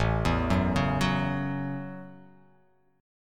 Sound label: A 6th, guitar; 5,7,4,6,6,x,x,x